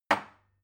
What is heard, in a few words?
glass put down cupboard hard